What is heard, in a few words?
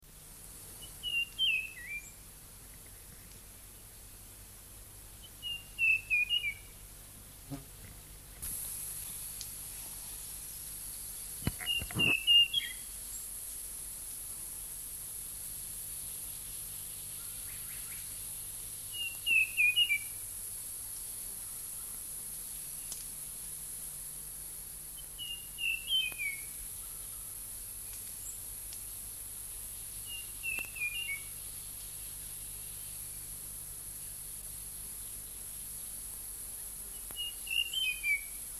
olive-flanked robin chat